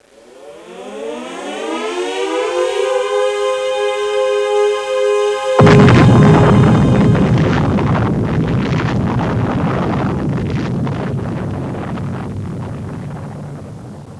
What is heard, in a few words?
nuke explosion